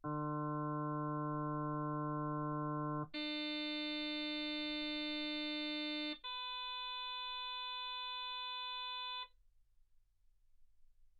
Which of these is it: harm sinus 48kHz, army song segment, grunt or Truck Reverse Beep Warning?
harm sinus 48kHz